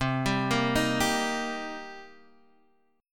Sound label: D sharp Major, C; D#/C arpeggiated; JGuitar